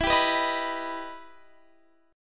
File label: E Minor Major 7th E down Guitar Drop D